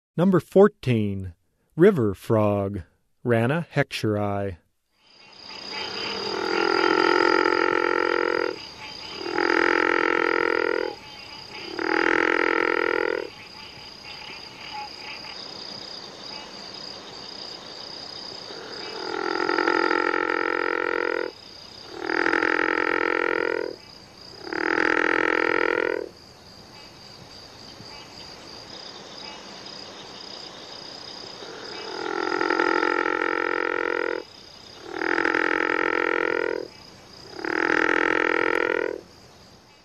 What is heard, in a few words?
Rana heckscheri - River Frog, Frog Calls; Herpetology; Bio227 - Herpetology